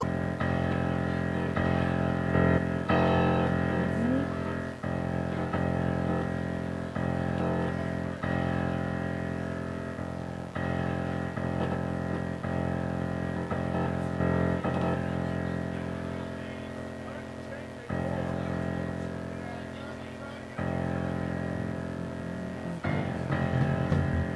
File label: tuning